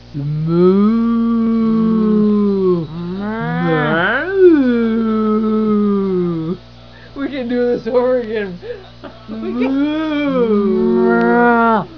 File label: moo